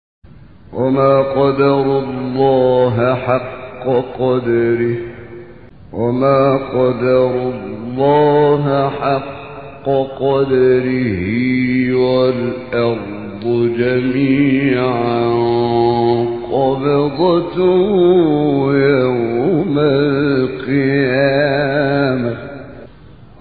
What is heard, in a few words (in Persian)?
تلاوت زیبای آیه سوره زمر; 67; محمد احمد عمران